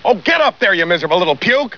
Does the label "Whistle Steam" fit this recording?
No